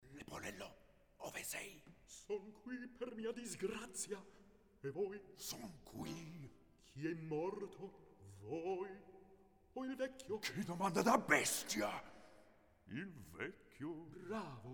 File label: Recitativo; Mozart Lirica